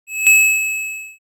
shy, ringtones; standard ringtones

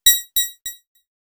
pizzicato, message tones

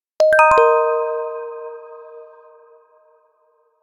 message ringtone magic

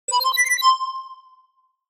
in closing; notification sounds; tone

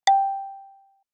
light, notification sounds

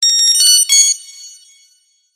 wake up tones; oringz pack nine

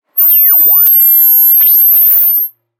interferences

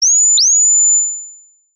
wake up tones; oringz pack nine

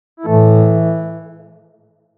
upset, sound tone; notification sounds

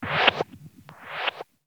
stealthy swoosh

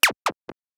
on target, message tones

